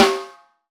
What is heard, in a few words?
Snare, acoustic kit